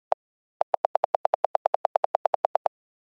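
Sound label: iphone keyboard delete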